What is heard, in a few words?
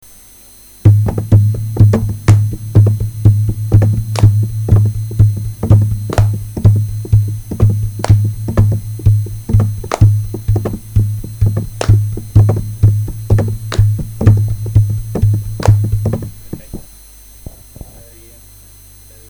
beat